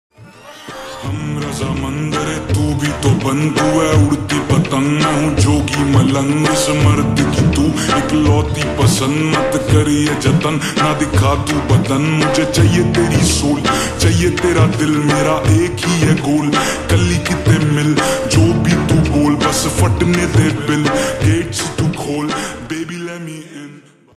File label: Slowed Reverb Ringtone